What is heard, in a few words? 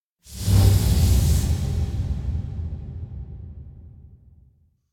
Impact Distant Deep Distant Blow Up; Hollywood Edge; sound designer's toolkit v2